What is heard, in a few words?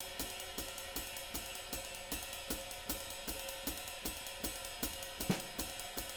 310 SWING, Living Drums; Sampleheads; Peter Erskine